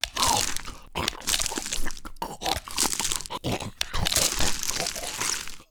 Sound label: Male Zombie Eating; Zomboid 41.78.16; Project Zomboid